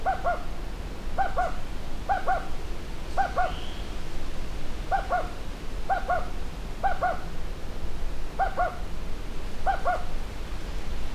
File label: Short cuculus sp, Gageo Korea